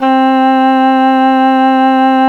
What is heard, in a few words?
english horn, reed